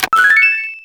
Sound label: Chest Item; New Super Mario Bros. Wii